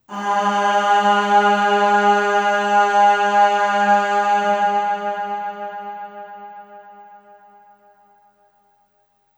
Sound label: Aah Long G#2 Long Reverb; Harmonic Building Blocks